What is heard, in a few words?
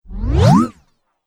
Rising Laser Speed Sweep